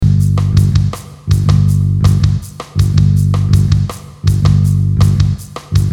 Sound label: Snare rimshot et rythmique; Piste 20, Strings, violons